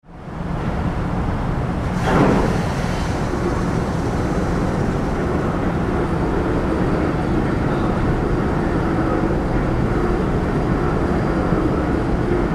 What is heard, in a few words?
Ship Large Ferry Car Deck with Hydraulics; Tom Hutchings